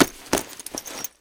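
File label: closing an old fishing backpack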